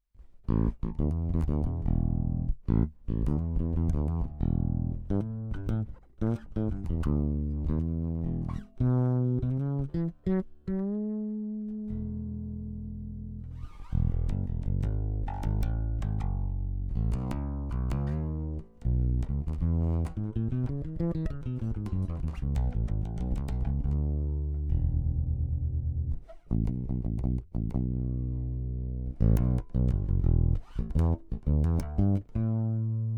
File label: bass, Mag Piezo